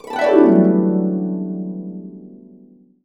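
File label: D major, harps; USB Soundscan vol.62; Complete Harps; AKAI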